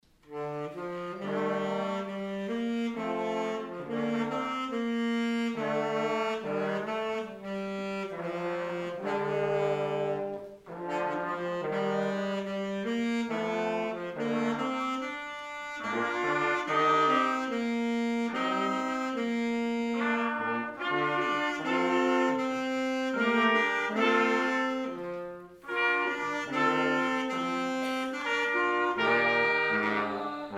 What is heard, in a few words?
Junior Brass; I vow to thee my country